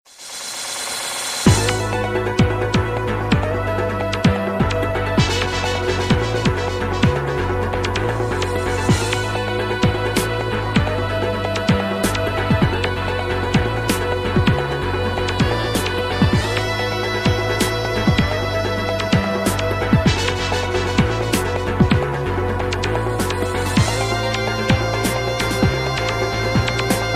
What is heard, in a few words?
Acoustic